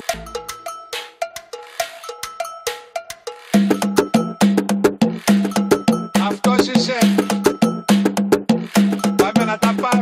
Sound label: Drum Beats